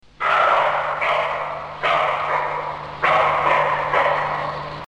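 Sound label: roe deer; kosul1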